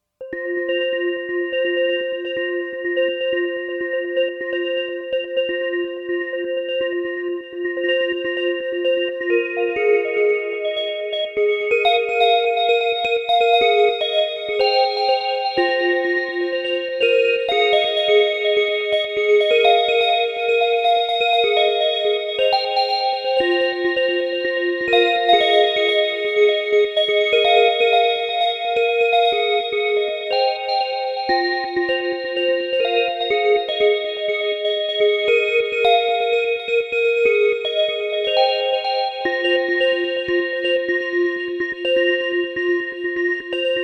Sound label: Chorused Cross Modulated Bells